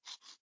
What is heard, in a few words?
Toothbrush Loop; talking tom cat 2 android ios online gamerip 2011